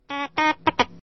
Mouse Droid Notifica

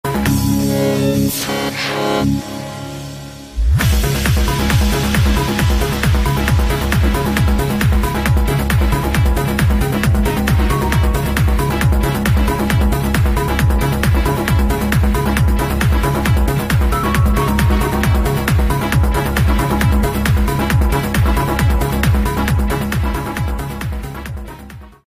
Trance

